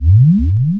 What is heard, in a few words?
Totem Run